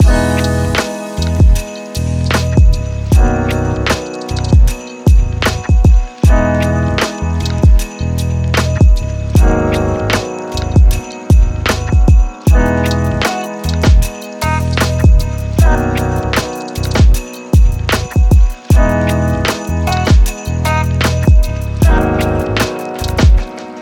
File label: cozy christmas lo fi loop